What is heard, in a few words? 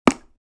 Dartboard impact dart hit; sport